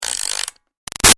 Toy Music Box Wind Up Fast; Music Box , Turn , Wind Up , Fast; Wind Up Toys

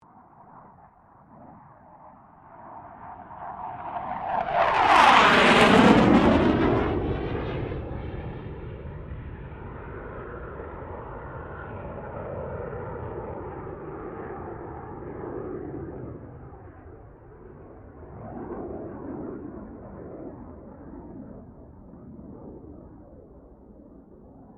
AIRCRAFT CA-27 SABRE PASS STEREO; CA-27/F-86 Sabre; Airplanes

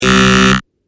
Electronic Buzzer Deep Long; Miscellaneous Electronic Sound Effects

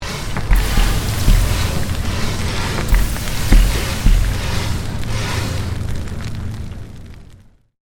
Created Magic Spell Meteor Shower

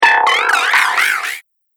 Twang Sound Take Off; Cartoon / Game Sound Effect

